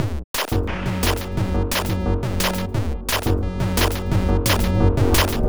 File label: Combo F#, 175 BPM; loops; CHIPSHOP DELUXE